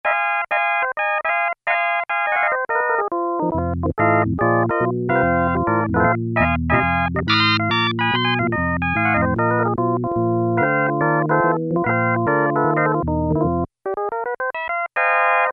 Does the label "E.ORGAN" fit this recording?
Yes